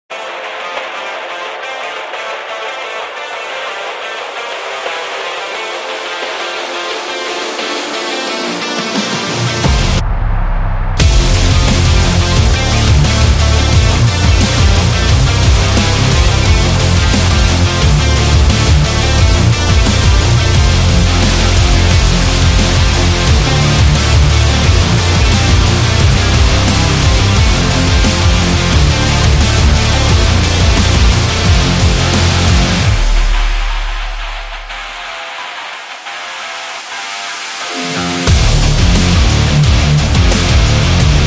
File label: Upbeat Rock